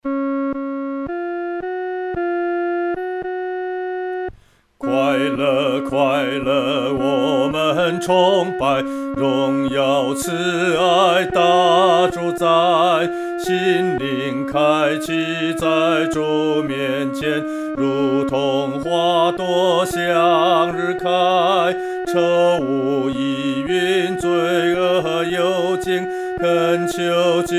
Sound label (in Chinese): 独唱 第二声